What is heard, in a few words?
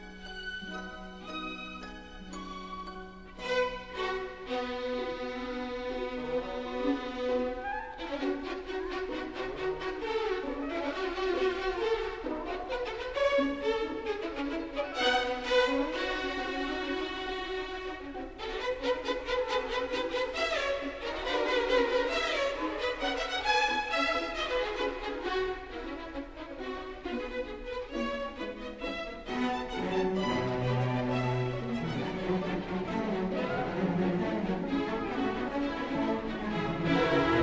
Concerto for Orchestra 2nd Violin; Bartok; Fritz Reiner